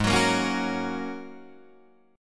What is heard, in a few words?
Ab 7th Suspended 2nd Suspended 4th, Guitar, Drop D